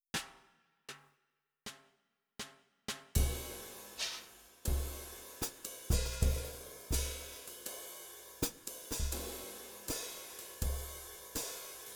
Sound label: minus piano